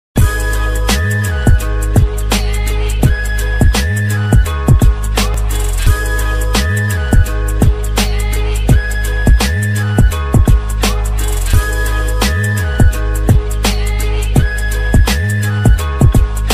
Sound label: instrumental